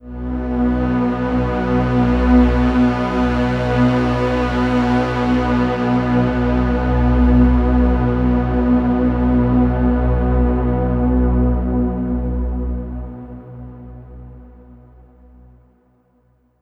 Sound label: Overlay Drone